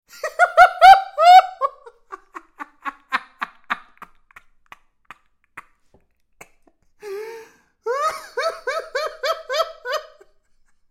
Laughing

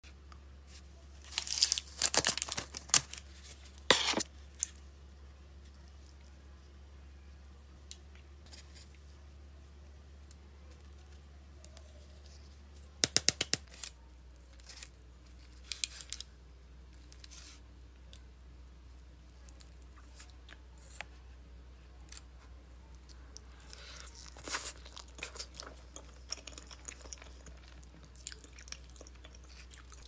french fries asmr; اسمر خوردن سیب زمینی سرخ

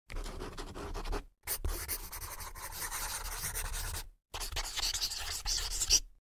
to sign

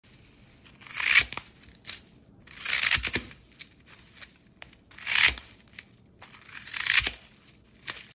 Flower sponge, صدای برش اسفنج گل

